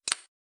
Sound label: info nav; Recode; jewel Quest Riches